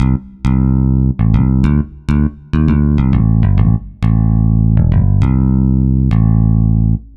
Picky Bass; Dr. Fink's Funk Factory

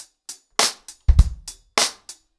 100BPM, ACOUSTIC DRUMS; The Roots Drumloops

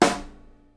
SNARE, Live Snares

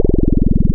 Filter FM Hi Q Bubbly, wavetables; Animoog

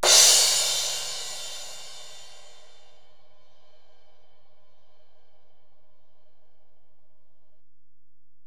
Ride, Alesis DM-PRO, Drum Machines

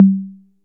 LOW CONGA; RAP CITY 1

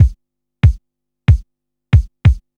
beat, Roots Funk; USB Soundscan vol.41; AKAI

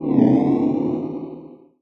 sfx sci fi; Masterbits - Switch FX

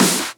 Snares; Vengeance - Essential Clubsounds Vol.4

